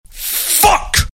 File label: pain death; voice lines; Billy; Smosh